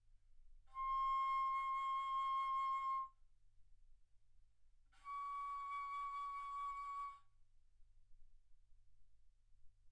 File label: Bass Flute C6 Db6; ff